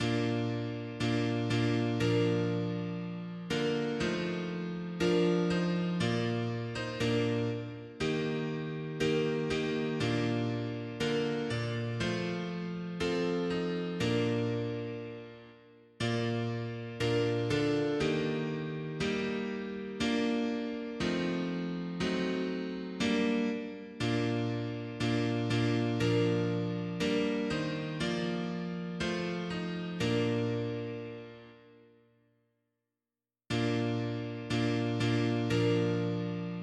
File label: mono piano; hymns; Enfants de Dieu